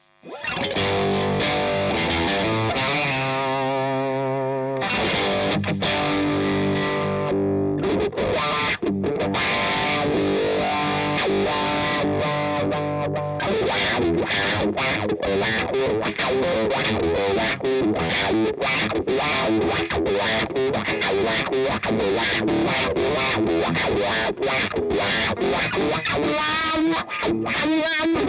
OD Singlenote Lines

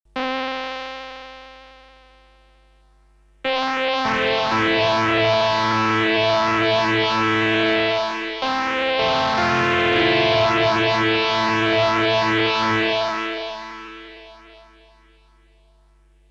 Synth Phaser; Red Panda Radius

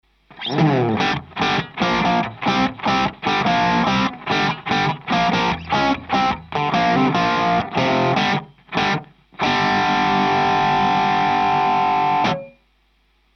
TwoNotes OPUS; Preset 10 The Smokey 70s

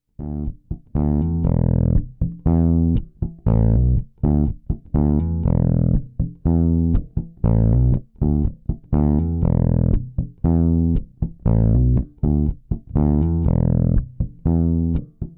LAKELAND MuMa TH Drive 0 EQ Flat

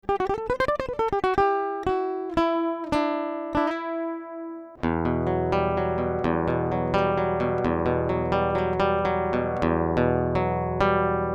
Acc Dry; Ibanez

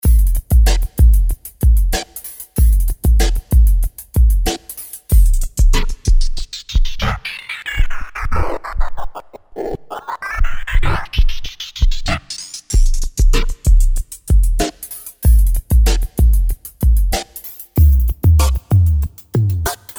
pitch stretch

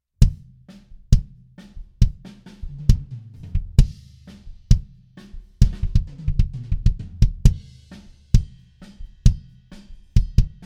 kick

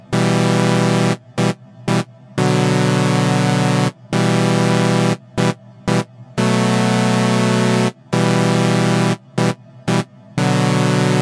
Keys smart reverb Freeze

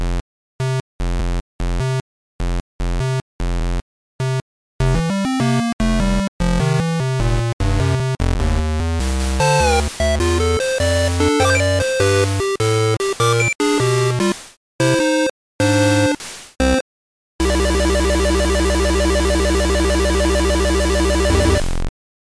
magical 8bit

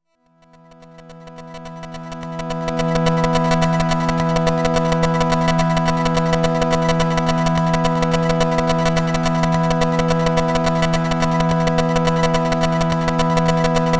Custom OSC Sine Square; Sonicware ELZ 1

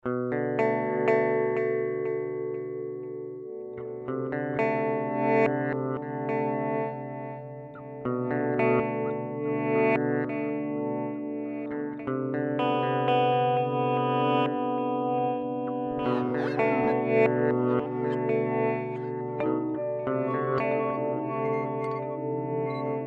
Particle Reverse

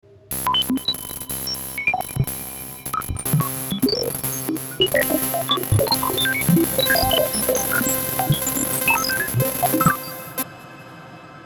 ASM Hydrasynth Rhythmic Space

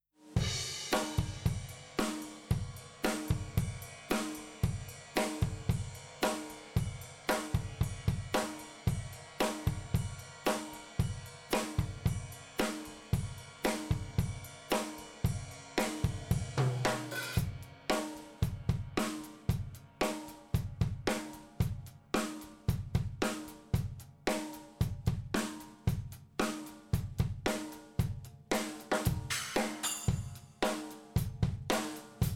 Drums MS

